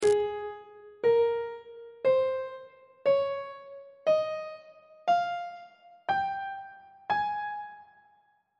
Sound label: a flat major note positions; on treble clef